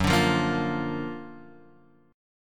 Gb Augmented Major 7th; Gb+M7 strummed; JGuitar

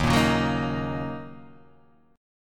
Eb Minor 7th Eb Guitar Half Step Down

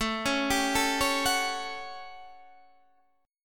A 7th Add 6th; A7add6 arpeggiated; Guitar; JGuitar

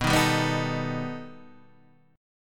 B Augmented 7th B; B+7 Chord {7 6 5 4 4 5} strummed

